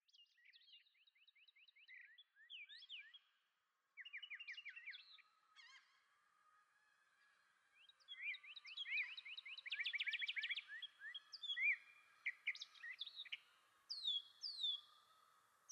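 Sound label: Meditation on Birds, Nature Sounds; New Age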